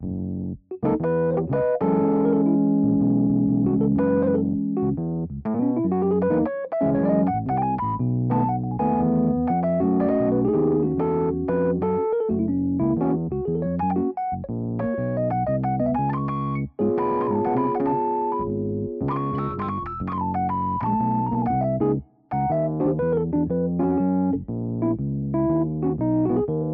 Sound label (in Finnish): rhodes jami